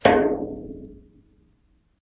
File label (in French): Escalier en metal